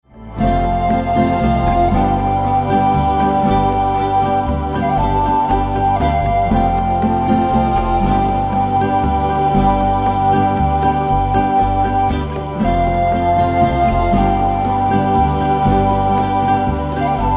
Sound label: Desert Wind, NATIVE AM FLUTE